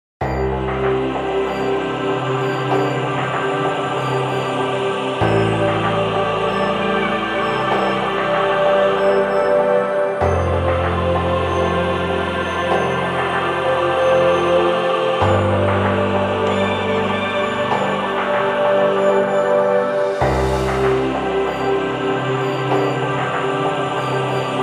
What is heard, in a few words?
Ambient